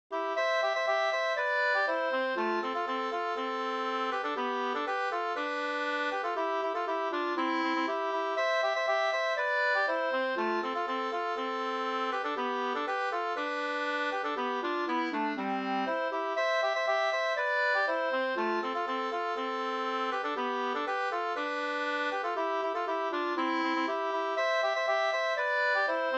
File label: polka